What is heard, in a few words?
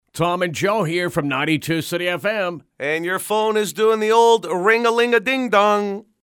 RINGTONE